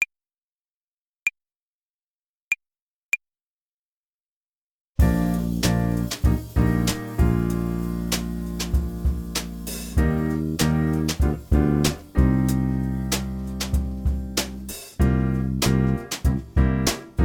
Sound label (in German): Play-along, Jazz Club Tenorsaxophon